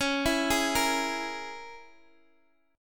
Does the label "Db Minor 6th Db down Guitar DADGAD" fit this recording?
Yes